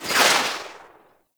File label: Entangle Projectile Pass By; Warframe; Oraxia